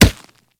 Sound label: Kubrow Run Dirt, Footsteps; Enemies; Warframe